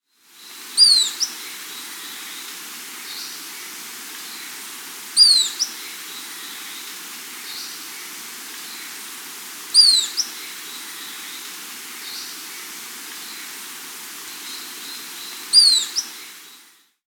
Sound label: Ruddy-tailed Flycatcher (Terenotricc; S. America